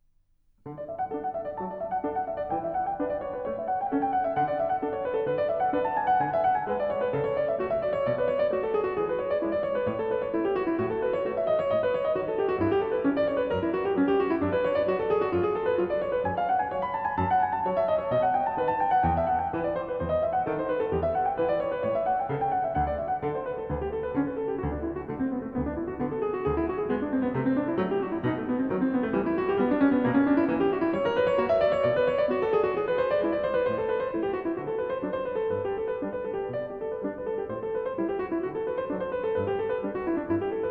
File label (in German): Präludium D-Dur; BWV 850; J.S. Bach; Das Wohltemperierte Klavier Book 1